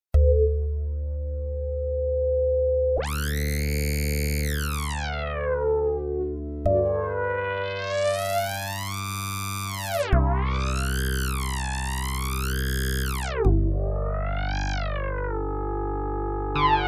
electrax comb

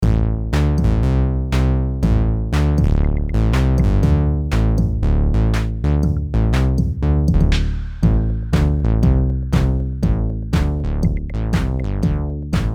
SH2 Plug Out

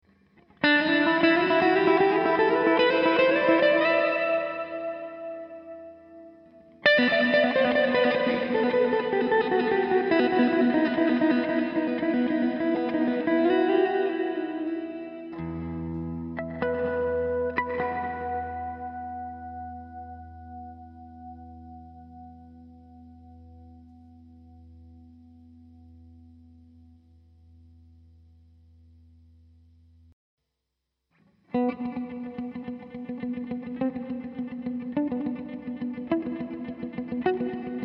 Cathedral

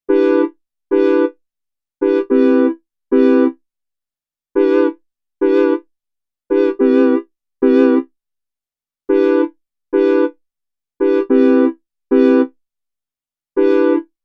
Nord Piano 5 Synth Vibrato

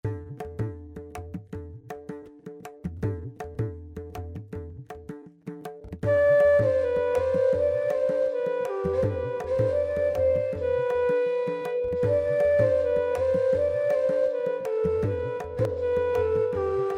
Bansuri Legato

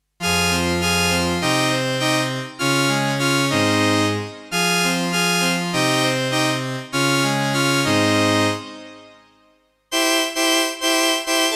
Vibrato SS-30

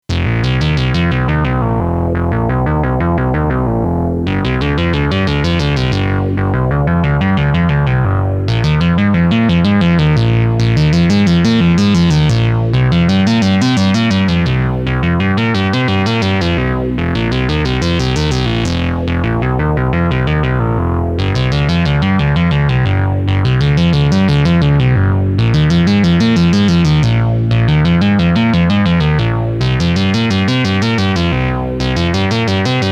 bass

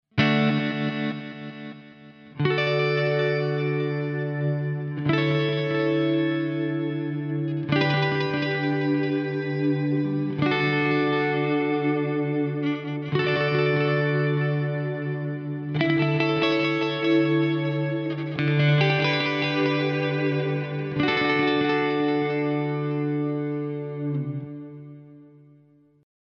Eventide Ultratap Delay

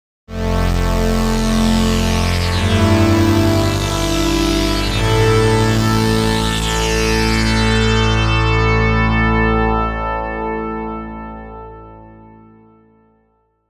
Fat sweep

